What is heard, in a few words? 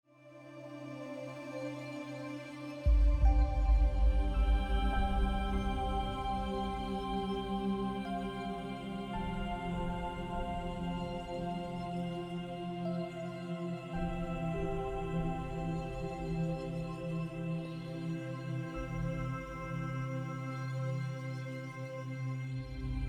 528 Hz Sleep Healing